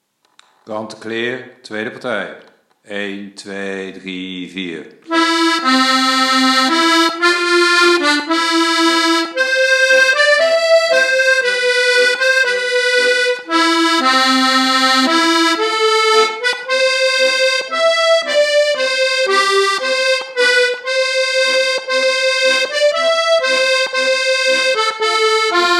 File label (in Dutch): chanticleer tweede partij